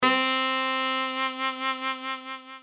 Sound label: saxophone